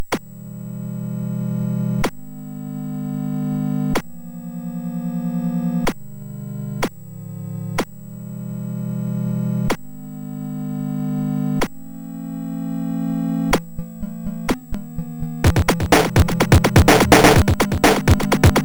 MOS6581R2, 8BitChiptune

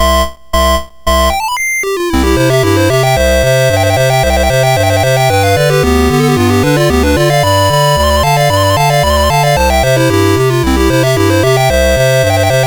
Clarinet Polka, MOS6581R2

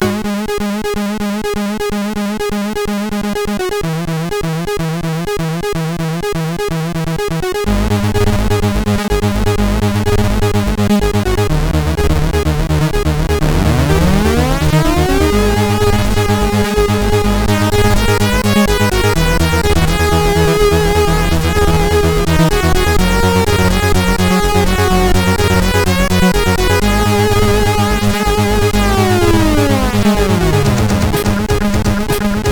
Spec 48k Ping Pong Remix, sid CSG8580R5

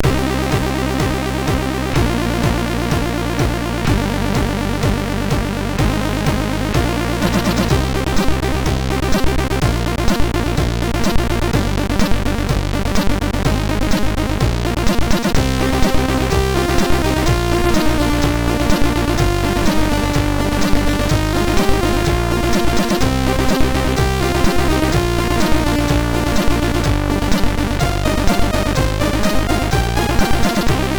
Intro, sid MOS6581R3; Amboss Intro

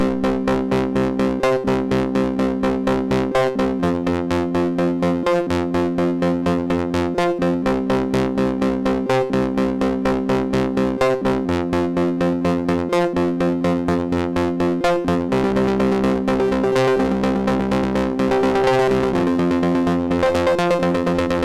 Guitar Flan, MOS6581R4

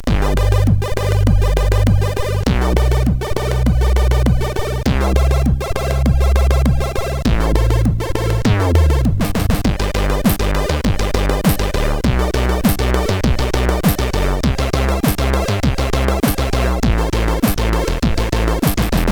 Singing Cows tune, sid CSG8580R5; HVSC

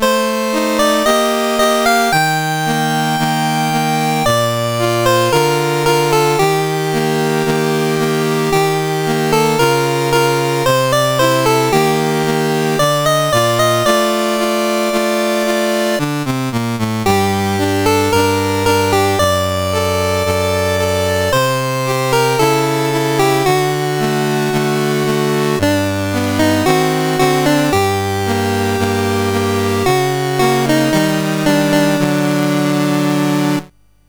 CSG8580R5, National Anthems; Morocco; Dave Marquis

